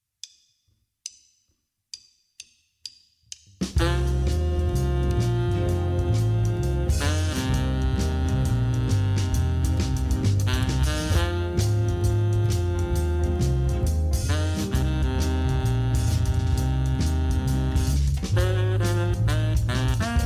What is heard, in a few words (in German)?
C-Moll-Blues